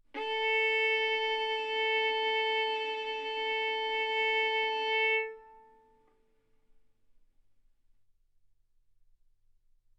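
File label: Violin arco mf A string A4 B4 stereo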